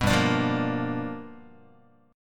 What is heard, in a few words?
G 7th Sharp 9th G chord, Guitar; G7#9 strummed; JGuitar